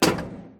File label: metal grate jump